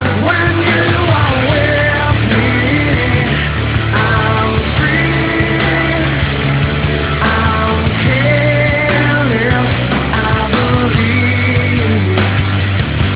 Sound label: bbox